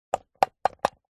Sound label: leisure rc car remote plastic joystick movement; zapsplat